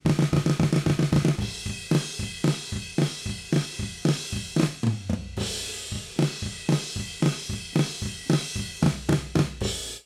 drum dual mono 35ms, Dual Mono with right delayed 35 ms